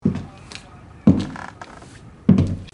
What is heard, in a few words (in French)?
Pas plancher